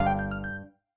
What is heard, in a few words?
combo 4 power